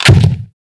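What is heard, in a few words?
grenade launcher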